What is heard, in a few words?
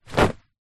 household pillow case drop ground